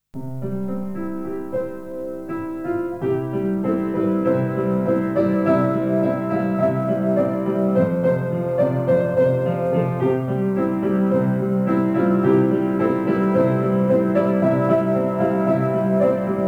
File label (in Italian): pianoforte solo musica; Cantici solo Pianoforte